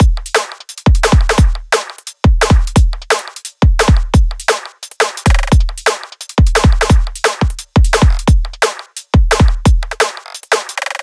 Drum Loops, 174; M8; Electronisounds

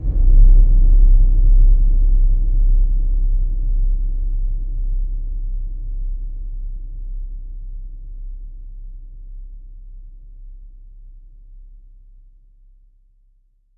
big boom, big rooms

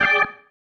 Stab One Shots, Organ

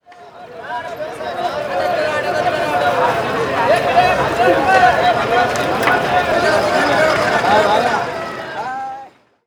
BOMBAY MAR, AMBIENCE, Indian Traditions; USB Soundscan vol.45; AKAI

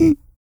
CUICA MAN; Spectrasonics Vocal Planet

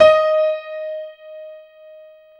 PIA MICRO, MICRO-PIANO, Roland

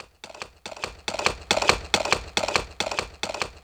HORSE RUN; Back In Time Records - Big Bang

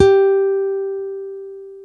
STEEL, Guitars

